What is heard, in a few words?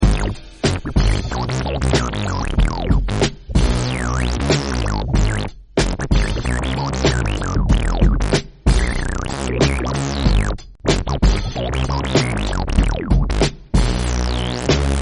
frog music